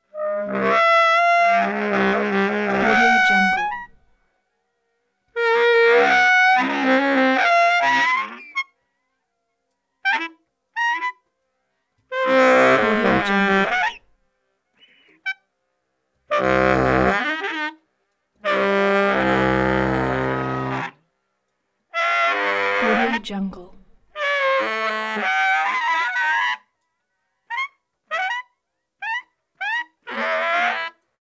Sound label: baritone saxophone lower to upper range runs